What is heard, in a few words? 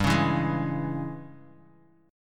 Ab Major 7th Suspended 4th Sharp 5th G, Guitar